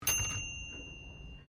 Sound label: TYPEWRITER 1950S BELL; Old typewriters; Typing; Offices